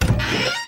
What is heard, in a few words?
door open, tardis; drmatt